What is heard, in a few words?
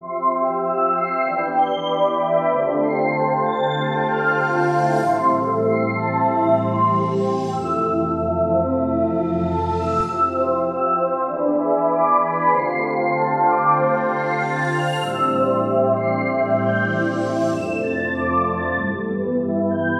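96 synth pad waves A minor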